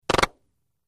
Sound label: Silenced Machine Gun